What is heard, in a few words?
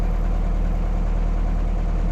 boat idle low; linetrack